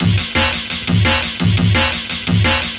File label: Swiftish FX, Loops, breaks; m8 breaks